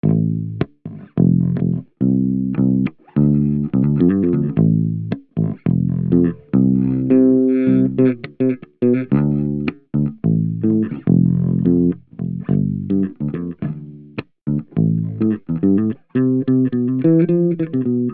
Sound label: bass riff am 106bpm